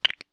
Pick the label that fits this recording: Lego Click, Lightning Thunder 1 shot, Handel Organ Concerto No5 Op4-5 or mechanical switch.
Lego Click